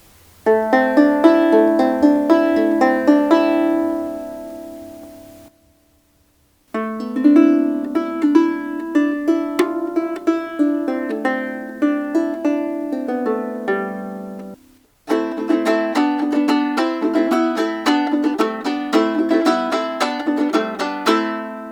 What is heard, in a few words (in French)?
lyre chevalet buis